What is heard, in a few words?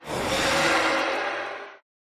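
pterodactyl boss die; pterodaktilj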